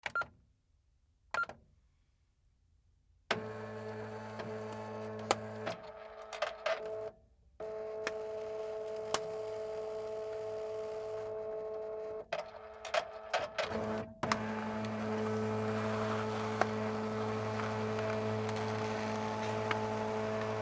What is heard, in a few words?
Fax Machine Send Fax; Electronics; Office; Blastwave